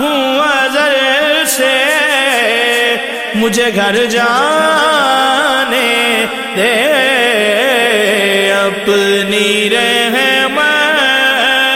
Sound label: Islamic Ringtone